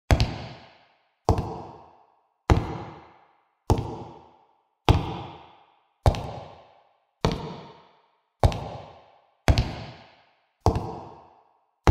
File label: creepy footsteps